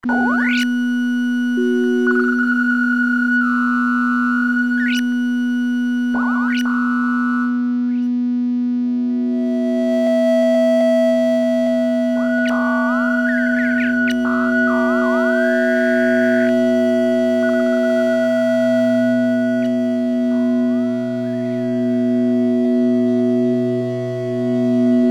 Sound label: Sinewave Project